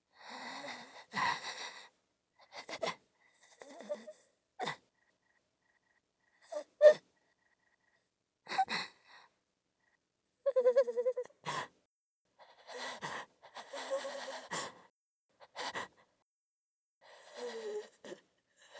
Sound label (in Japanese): かすかにある感情で泣いてる